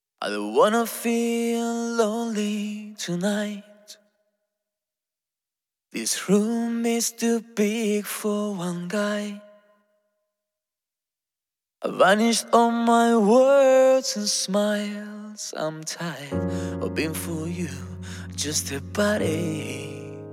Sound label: Orchestral Version